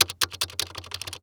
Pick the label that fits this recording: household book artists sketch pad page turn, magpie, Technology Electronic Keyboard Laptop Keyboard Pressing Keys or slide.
Technology Electronic Keyboard Laptop Keyboard Pressing Keys